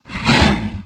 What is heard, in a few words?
vox attack